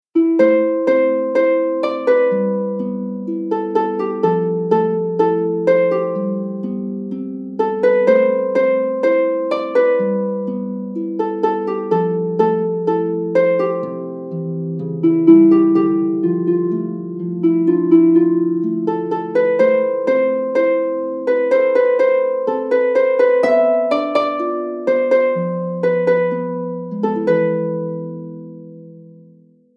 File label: RingTone